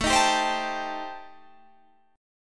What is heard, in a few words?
A-Minor 7th Flat 5th, Guitar Half Step Down, chord